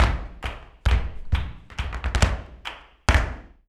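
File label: percussion, FARRUCA TAP, Flamenco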